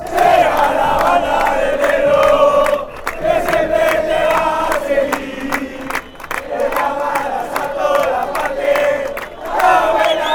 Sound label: patronato chant, fan chants